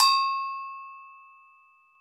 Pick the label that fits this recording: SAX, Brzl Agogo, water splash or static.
Brzl Agogo